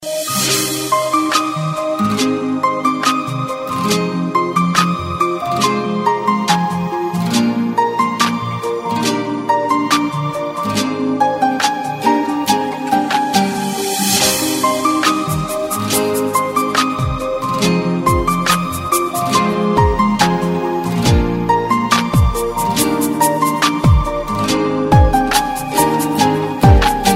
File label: sentimental piano; classical